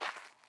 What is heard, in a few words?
Footstep Landing